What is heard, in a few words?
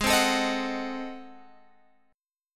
G sharp 7th Flat 9th G sharp down Guitar Open F, chord; G#7b9; strummed